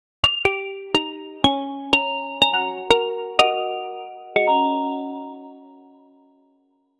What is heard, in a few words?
an amateurishly composed, amateur